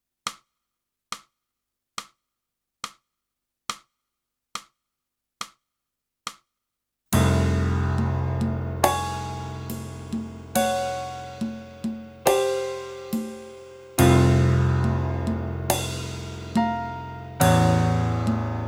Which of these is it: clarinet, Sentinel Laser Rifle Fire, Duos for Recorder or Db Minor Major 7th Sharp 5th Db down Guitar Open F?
clarinet